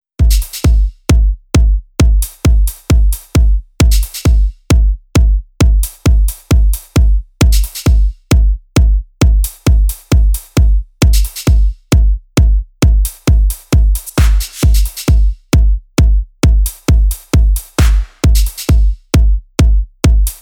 ambience

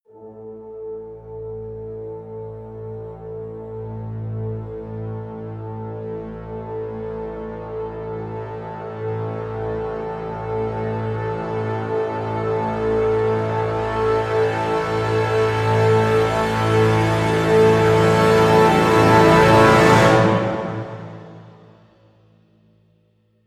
cres brass swell; brand x music

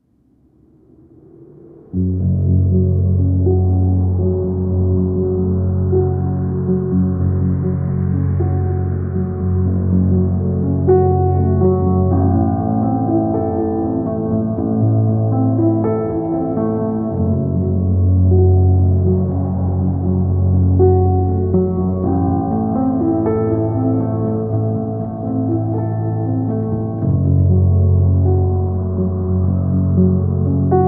instrumental

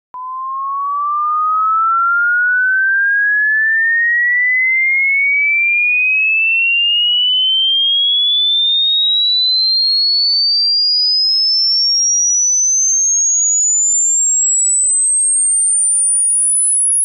1k to 20k 10 db

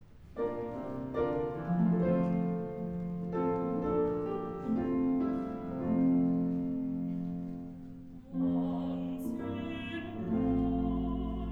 welsh male voice choir; melbourne; once in royal davids city

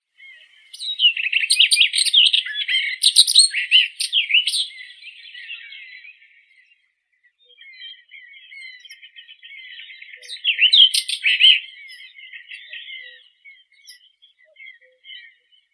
slavka yastrebinaya sylvia nisoria

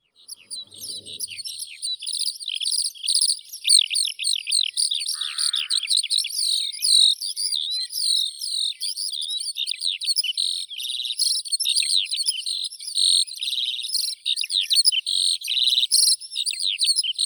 zhavoronok polevoi alauda arvensis

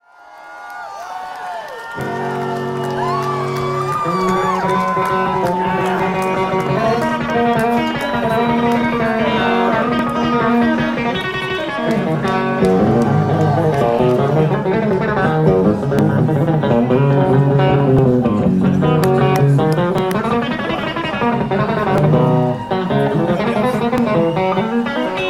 solo

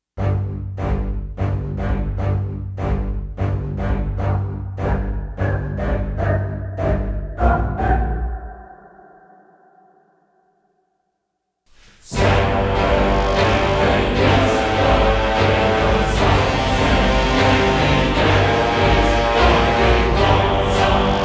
orchestra and choir only; immediate music

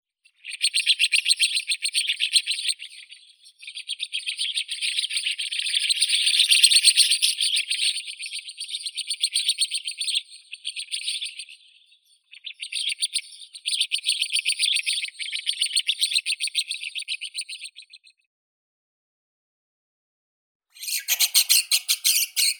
skvorets rozovii sturnus roseus

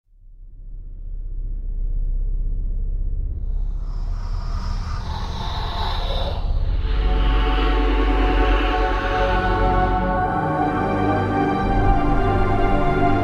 no music box

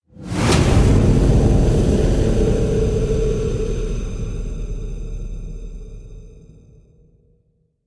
evil slams with whoosh; chimaera

